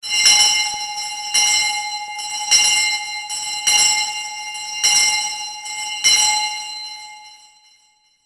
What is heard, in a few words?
chaimu; Higurashi no Naku Koro ni Matsuri: Kakera Asobi; PS2